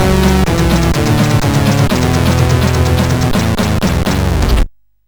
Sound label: sid CSG8580R5